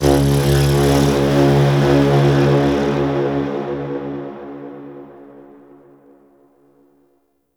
Ship Beam Warning; DE; Warframe; Weapons; Archwing; Fomorian Ship

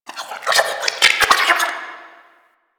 Flesh Tunnel Critter, Ambience

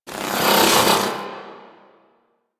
Bow Draw; Warframe; The New War; Quests; Weapons; Omicrus

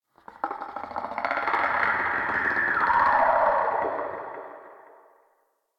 Chatter Scream; Ambience; Misc Outdoor; Warframe; Infested Eris

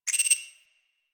Tech One Off, Ambience; Warframe; Rail Jack

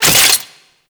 Necramech Repair Drone Idle Short; Warframe

